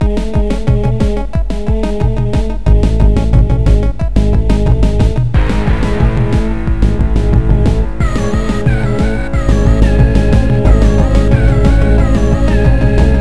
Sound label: Play Music; Bob the Fish 1.6